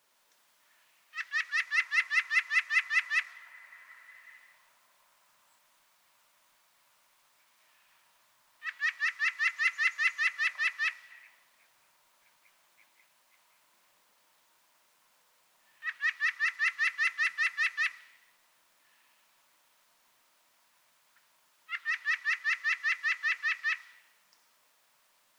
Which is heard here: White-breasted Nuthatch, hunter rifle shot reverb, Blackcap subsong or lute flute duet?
White-breasted Nuthatch